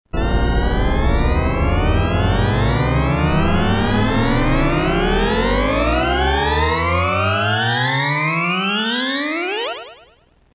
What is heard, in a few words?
Wind Up